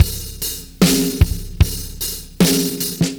Beat Loops; Zero-G; Monster Beats

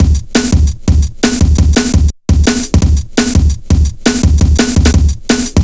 170, Drum Loops, Drum & Bass

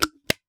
Coffee Jar, household rhythm samples Hits

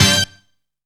THEIF HIT; Zero-G; Funky Elements

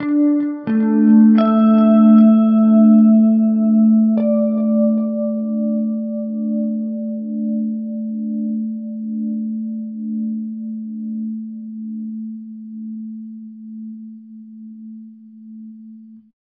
Guitar FX, Stringed Instruments, World Pop

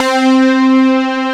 BASS, MEMORYMOOG; Rubber Chicken Software Sample Bank; OLD SYNTHS

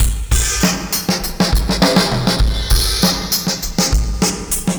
R&B Drums

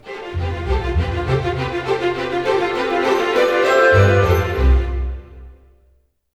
BIKE; East West; Scoring Tools; ORC; Bike Ride F

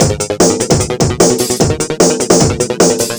150bpm; Phat Club Full; Combi Loops; Nu Dance Electro

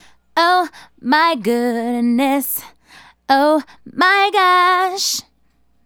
Female Solo, Hip-Hop and RnB Vocals

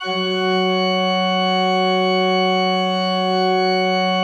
F2, Cathedral Organ; ILIO; Propeller Islands Cathedral Organ

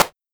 Simmons Clap

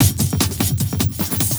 Beat, Industrial

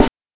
GHOST SD; NES DPCM; MIDINES

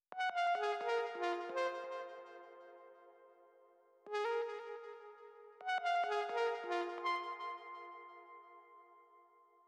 jazzy pluck; lead arp chord melody

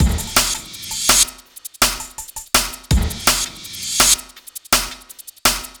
Beat, Futurist Drum & Bass; Drums & Percussion

